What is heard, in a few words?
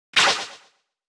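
attack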